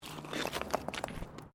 Bricks Movement; Material components for constructing more complex sounds; Rocks; Materials

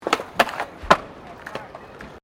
SKATEBOARD FLAT DROP; Skateboarding; Sports

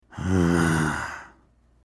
grunt male, Man Grunts; Guttural; Voice

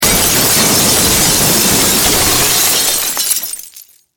Impact Crash Wood Glass; Materials; SFX Bible 6

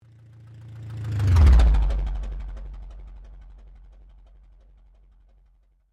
Sweep Mechanical Slow; Created sweeps, swooshes and other movement effects; Sweeper; Sweepers (Sound Effects:Sound Design); SoundEffects-Foundation4

